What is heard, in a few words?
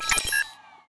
hologram